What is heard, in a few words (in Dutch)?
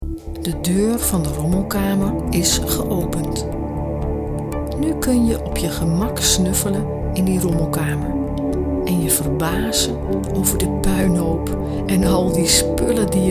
Meditatie CD Ontspannen